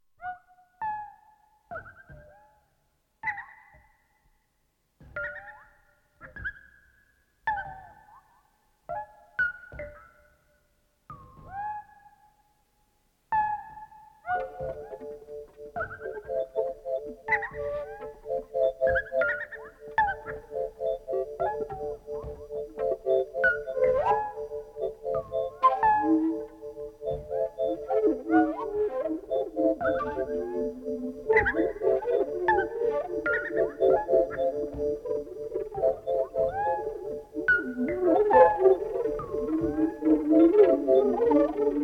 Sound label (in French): melismes sur ostinato, vibrations composees petite polyphonie